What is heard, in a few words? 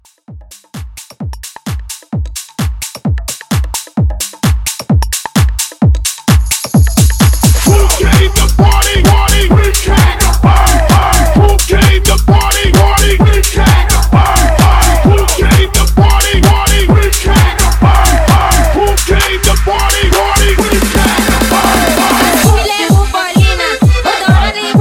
Club Party Break Intro